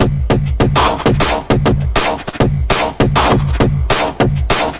200, drum loops and breaks; 181 bpm plus

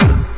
floppy verby hardcore kick; Kicks; single hits

